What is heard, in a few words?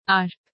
arp